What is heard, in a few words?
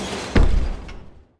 crane dropping box; ringtone; Mini Militia